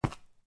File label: stone, step; Eaglercraft 1.5